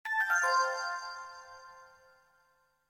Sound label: logout old